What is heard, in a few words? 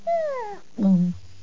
furball yawn; furball prank